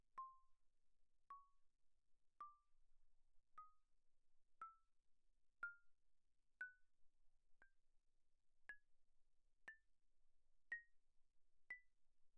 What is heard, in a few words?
xylophone hard rubber pp C6 B6; Percussion